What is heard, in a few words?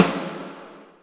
Concert SNR; Roland M-OC1